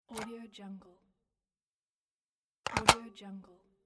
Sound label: office phone pick up hang up